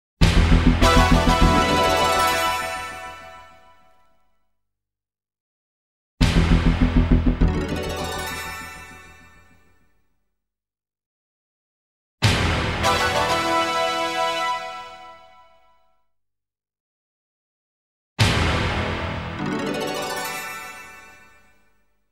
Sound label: Splash Cut, Sounders, Bumpers; Sounders, Bumpers, CUES & IDENTS; DennisMusicLibrary